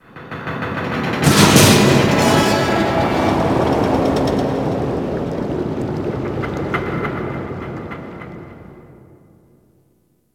Ocean Metal Bubbles; Ambience; Warframe; Grineer Ocean

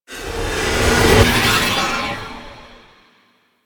Eye Ring Settle; Ambience; Orokin; Warframe; Orokin Moon

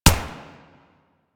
Fire; Warframe; Vipers

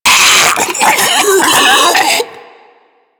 Infested Diseased Ancient Attack; Warframe

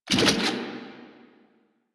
Pick Up Weapon; Warframe; Caliber Chicks minigame; pick ups

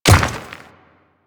Dirt Step, Footsteps; Warframe; Grineer Royal Guard

